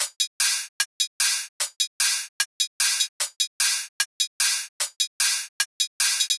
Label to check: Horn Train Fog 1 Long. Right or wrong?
Wrong